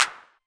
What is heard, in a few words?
Clap, Novation Drumstation; DrumstationV1